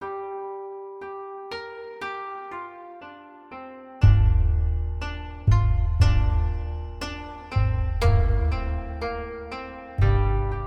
Koto Onioin Samuraisin Flauta; Kyurinaga's Revenge

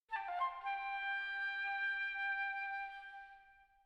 SkyDive

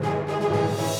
Music Fight Theme Average 120bpm Start; asterix obelix slap them all 2; Romans

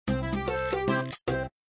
New Customer; Papa's Donuteria

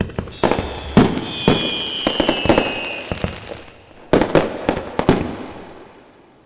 arena fireworks; Pix the Cat

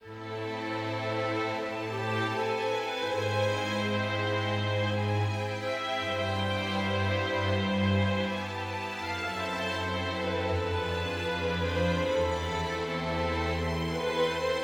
sad forte

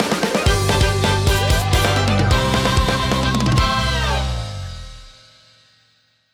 Win Jingle

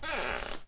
Floor wood

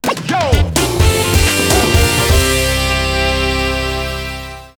Goal; daytona championship usa daytona 3 arcade gamerip

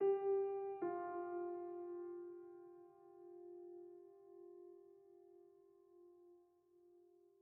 Env Field Night Ground

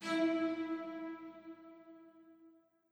chime; chime sharp

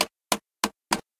Wheel click medium

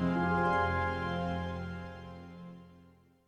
Music Dialogue Suspense End 50bpm

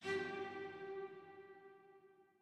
chime old; PS4; Chime Sharp

